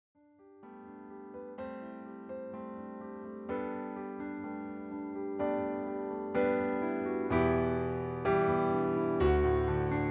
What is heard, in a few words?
Holy Tenor